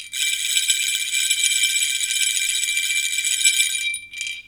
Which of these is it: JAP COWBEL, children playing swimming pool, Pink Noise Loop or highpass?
JAP COWBEL